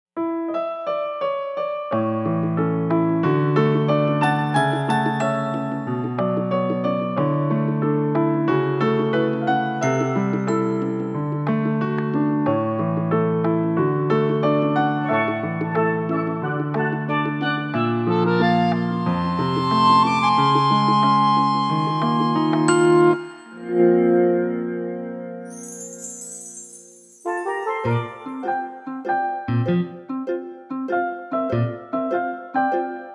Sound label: music